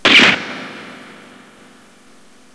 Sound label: Bullet